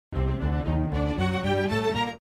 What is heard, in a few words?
Combat Sting